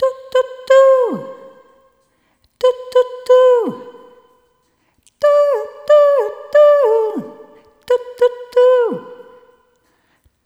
Rhythmic A

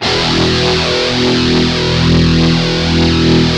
Barchord, GUIT; Best Service Gigapack 2

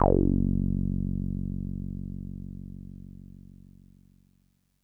TB F#1; Music Store Sound Service; Einstein

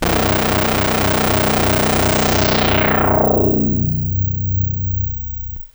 Monotron Sci-Fi

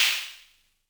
Snare, Univox SR-95

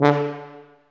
TUBA, Solo Brass, Tuba stacc; Roland LCDP12 Solo Brass; BRS Tuba

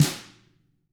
tama hit; ILIO; Double Platinum Drums 1

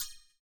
CYM, Ethno Techno; ILIO - Ethno Techno

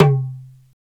Darabuka, Hand Drm; Roland LCDP14 Africa VOL-2

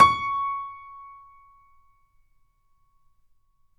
FF C#5, Grand Piano; AKAI S6000 CD-ROM - Volume 2; Grand Piano 256M

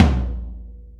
gretsch tom, deep house single hits; Zero-G Deep House

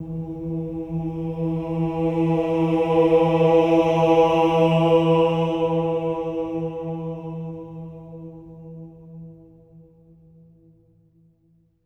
OH E3, Symphony of Voices, SWELL OHS; Spectrasonics

